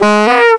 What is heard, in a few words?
CHEER